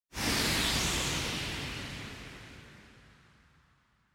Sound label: Motion Speed Lines Short; モーション スピード線; 短